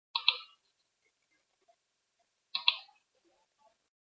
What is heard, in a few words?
mouse click sound effect